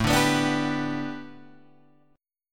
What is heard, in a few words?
A 7th Suspended 2nd Suspended 4th Guitar Open G; 7,7,9,7,8,9